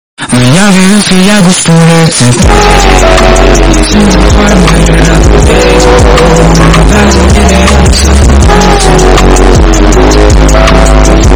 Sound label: distorted